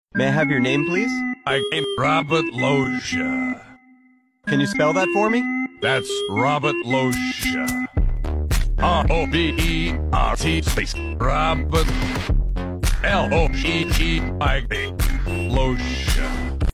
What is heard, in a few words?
phonk